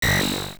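Sword Clang, Sword clanging against a wall or shield; AOL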